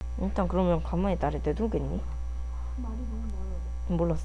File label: bucket